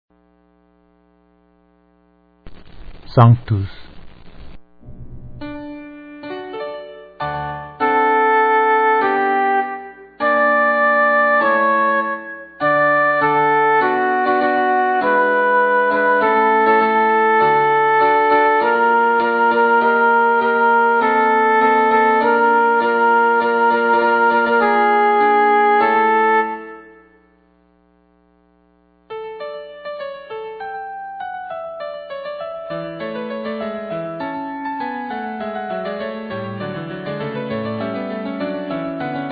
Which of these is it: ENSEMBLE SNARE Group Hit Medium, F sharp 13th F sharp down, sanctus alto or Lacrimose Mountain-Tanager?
sanctus alto